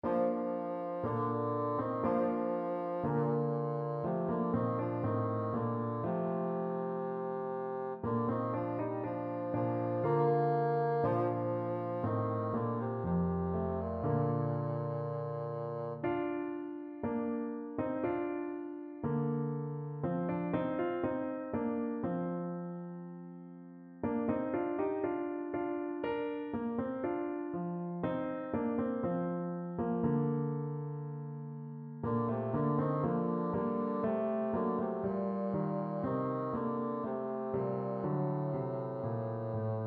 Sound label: bassoon